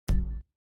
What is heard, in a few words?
menu back